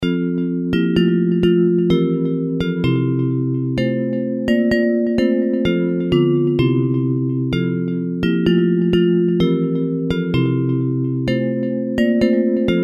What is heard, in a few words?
Bells